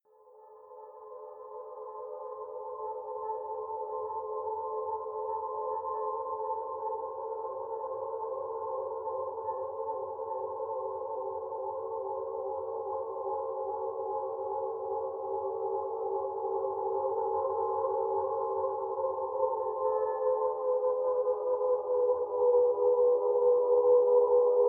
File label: aeolian long string opera; Musique Eolienne; Valkyries